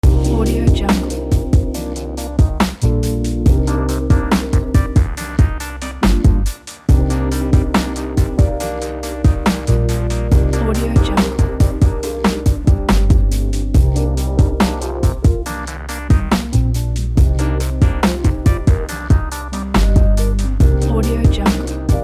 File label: lounge loop; hotloops